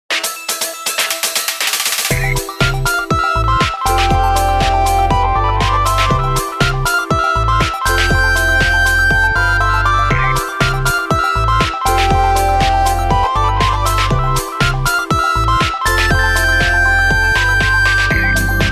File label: adorable